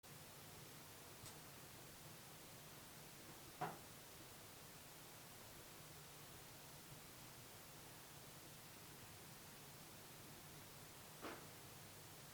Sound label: various knocking noises; EVP; Devoe House